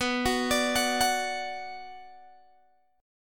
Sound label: B Minor B Guitar Open G